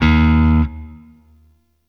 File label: Sentient Shawzin Oct Two D Sharp; Warframe